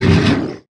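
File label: Wooden Beast hit; Swamp Of Darkness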